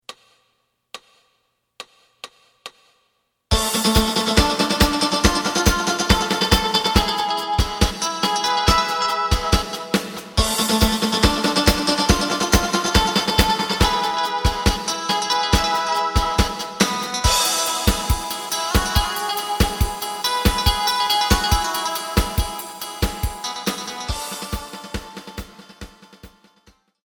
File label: bass